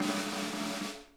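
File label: snare roll, drum kits; Sonic Reality; Interactive Drum Kits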